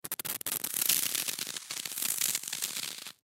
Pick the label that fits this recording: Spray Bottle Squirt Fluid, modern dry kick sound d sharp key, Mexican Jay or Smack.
Spray Bottle Squirt Fluid